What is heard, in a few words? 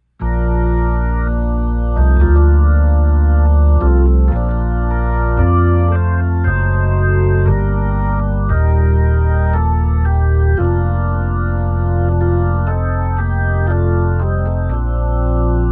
122xb Hymn, hammond organ